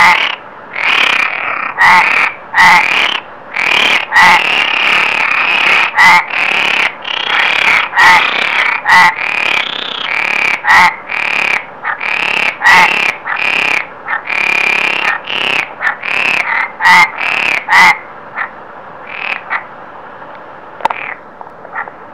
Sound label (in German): froschkonzert; biotop; Froschkonzerte